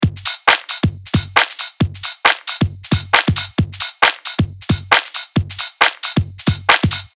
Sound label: Kick Snare Hats 16D